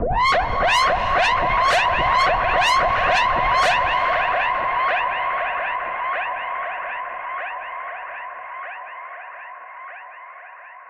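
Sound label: Modulation Processed, alarm siren samples 125bpm; musicradar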